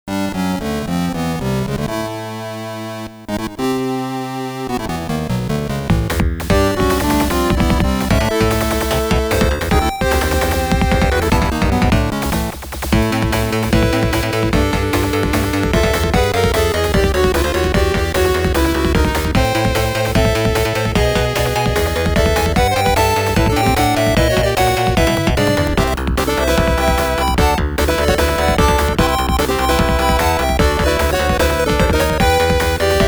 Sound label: FM Instrument Version